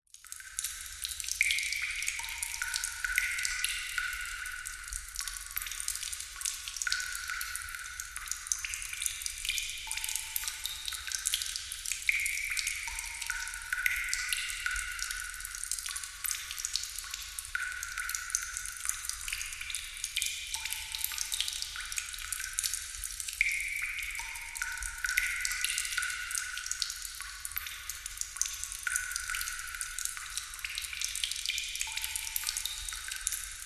nature water drips large splatter reverberant cave or abandoned warehouse